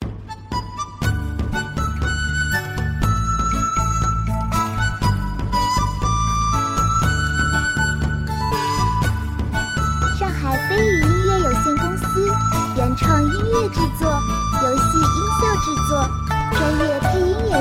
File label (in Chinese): 轻松-欢快-剧情-中国风